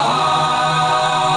Key chant; Luxembourg Zwee

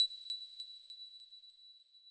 plack

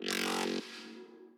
wobble

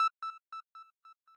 arp

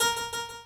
harp

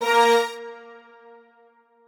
strings

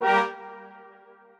strings

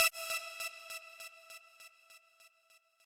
lead

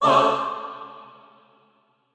chor

